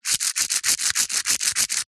toy aerial disk launcher cord pull spin multiple fast